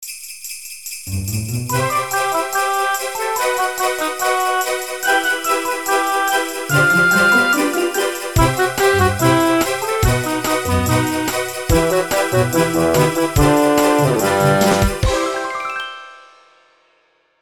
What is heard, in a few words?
Main Menu - Christmas; block breaker deluxe collection; Java